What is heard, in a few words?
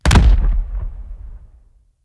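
grenade, impact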